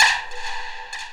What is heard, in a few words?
noise, FX & ATMO, ALARMS & ALERTS; Techno Trance; Techno Trance Essentials